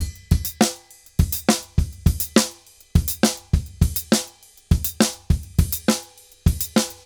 Drums 136, Festa Latina, Drums & Percussion; Best Service; Festa Latina VOL-1

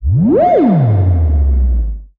Simple OSC Spring Reverb; radiosonics; Classic Simple OSC FX

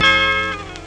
Gtr Slide; Mo' Phatt; Digital Sound Factory Mo' Phatt

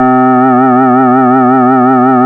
synthesizer C2

